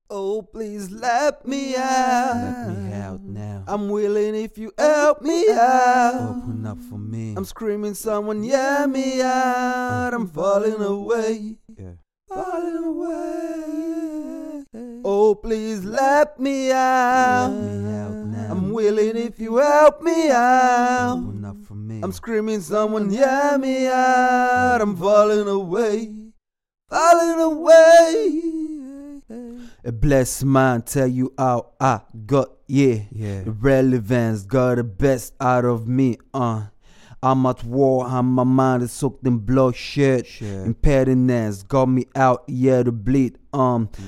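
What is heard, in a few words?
rap sing hip hop rnb 62 A sharp major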